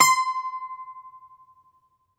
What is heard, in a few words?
Greek Bazouki, Plucked Strings